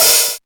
open high hat sound g sharp key, Royality free open hi hat one shot tuned to the G# note.; open hat samples